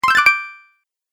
Bonus Collect, video game sound effects

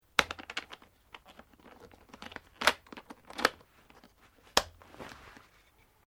Ski Boot Adjust; Snow Skiing; Skiing and Snowboarding; Sports

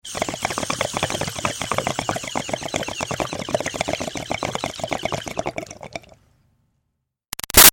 Bong Water Pin In Inhale Bubbles Long; Nature; BigRoomSound

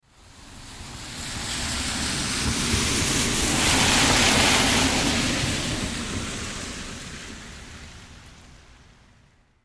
Car Drive By Wet Road Rain; Transportation Automobile; Vehicles

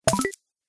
Loot Coin, video game

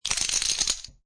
Poker Chips Stacking Chips In Hand; Gambling; Casinos

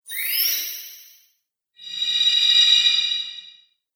Magic Spells; Magic Sound Effects

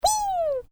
Created Creature Small Call, Alien Sound Effects; Created creatures, vocalisations and pretend speech

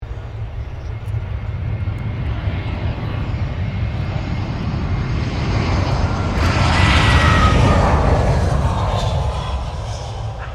A320 Airbus land; Airplanes; Vehicles

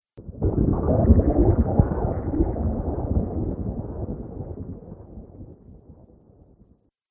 Underwater Element, Water; nature

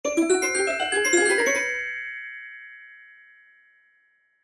Glockenspiel; Glockenspiel Pizzicato Strings Melody Whimsical; Mallet Sound Effects; Blastwave

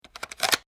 Click Mechanical Weapon Clip Latch; Latches (Sound Effects:Objects)

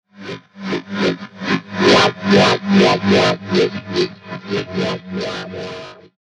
Faulty Lightsaber; science fiction sound effect; Light Saber Sound Effects; star wars / trek style games

